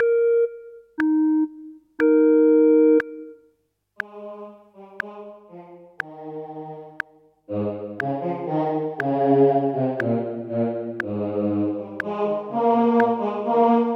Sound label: O clap your hands; Gibbons